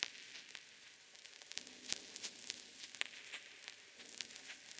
Cracklature, sparse soundscape

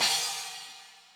crash, Millenium MPS-400

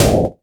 AUTO DANDAN, DANCE PERCS; Crypton; DrumBase5000

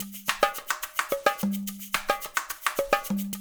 percussion loop; InVision Interactive; Percussion Slam; RULE WORLD

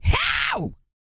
E YELL, MALE HUMAN; ILIO; Vocal Planet

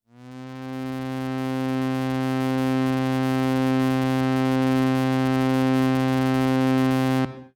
Pad C, Synth Prophet One Shots; Electronisounds

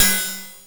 cymb, cymbals

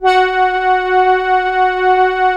SIEL, String Machines

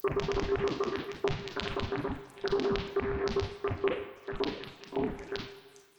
Tonal Re Draw, sparse soundscape

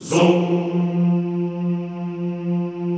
ZOOMMM F2, Classical Choir; Best Service; Extended Classical Choir

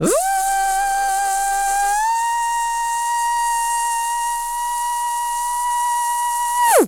DRILL; Spectrasonics Vocal Planet; Jazz FX

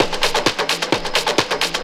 drum 130 perc, drumloops

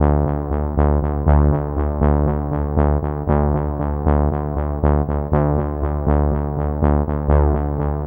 Analog Fingered; SFX & One-Shots

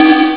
cowbell, Amiga Soundtracker Sample Packs

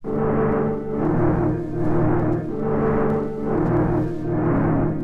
Fake loop 95BPM; radiosonics

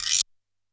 Guiro Long, Standard Kit, Yamaha PSR-E323

